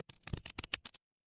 glass crack

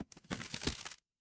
paper fabric crumple

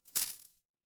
feedback celebration

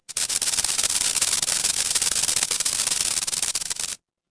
fire electric spark

